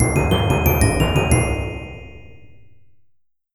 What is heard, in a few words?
bell sound quality